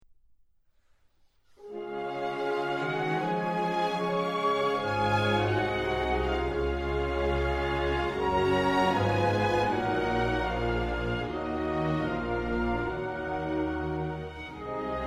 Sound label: hymne hungary